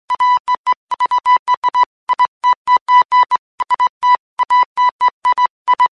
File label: morse code